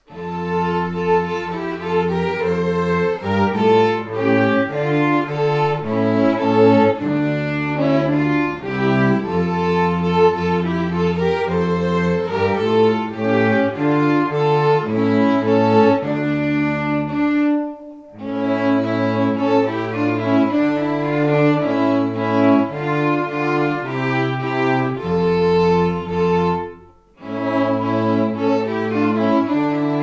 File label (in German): streichorchester ukraine anthem